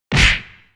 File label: Punch